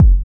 Kick, Kickdrum; Equipped Music; Loungin House

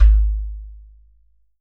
GHATAM; Q Up Arts; Denny Jaeger Private Collection

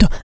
HIT, Jazz FX FEM PERC

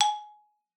XYLOPHONE G#4; Xsample VOL-4

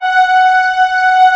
ORCH F#3 PP, Orchestra; Sound On Sound; Peter Siedleczek's Orchestra

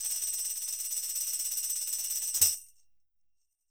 Roland MV8800 percussion

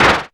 Axxe HC, ARP Axxe Drum Machines

